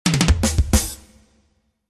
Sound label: Splash; Super Granny 5